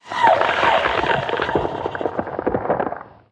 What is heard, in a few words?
death; twin